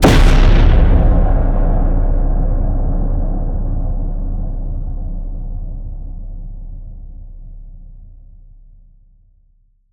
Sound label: HIT Distorted, Cinematic Hits Designed